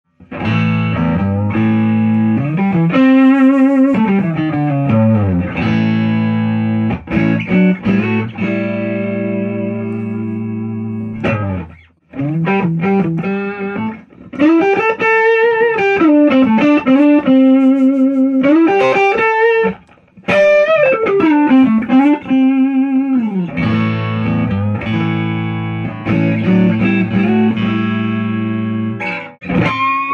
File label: clean pushing amp